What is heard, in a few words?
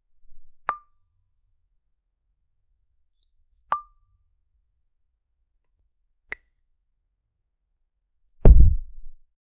a drop of wine falls, liquids